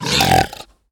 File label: piglin death; Minecraft